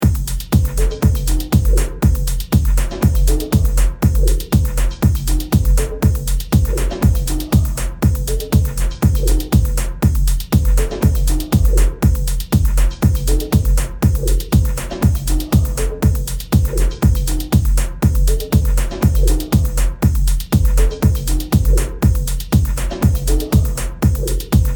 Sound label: instrumental